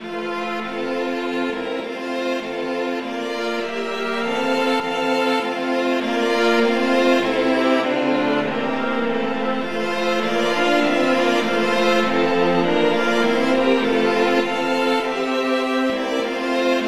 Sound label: polyinstru; hymns; Himnario grande